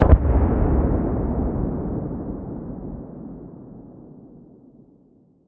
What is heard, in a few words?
combat atmosphere; World of Tanks